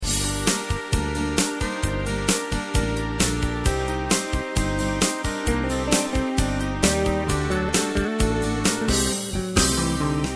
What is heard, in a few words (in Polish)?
powierz sie matce instr